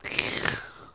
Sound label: leech suck; Emperor - Battle for Dune